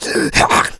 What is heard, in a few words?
attack; slender; zombiebm